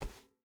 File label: footstep burlap metal walk; Rust